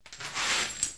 amb lounge misc; Earth and Beyond; EA Games

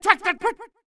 yells

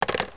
Hang up Phone; DR. BRAIN

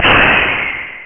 PNEUMA; Flashback Demo

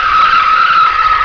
skid

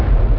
Ball rolling; Alley 19 v1.11

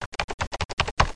Typing

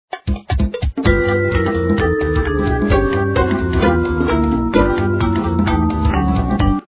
Music Down

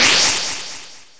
Power

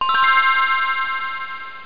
magic twinkle

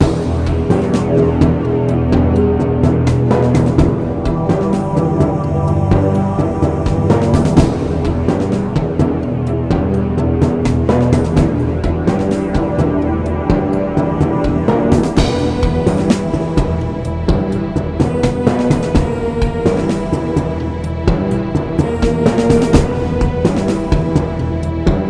Taps Song; PC Gamer IT CD 31; Defiance; Music

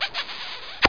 kiss; d20disk

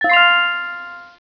bell

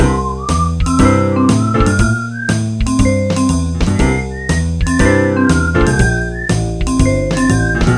loop; Sexy Games 3

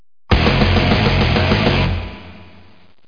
riff

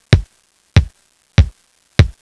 Bass Drum; Soundsation; Music

